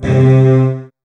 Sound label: Virtuoso Strings